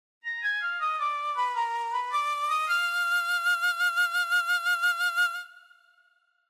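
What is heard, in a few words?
synth; Maize; JUNGLE JUICE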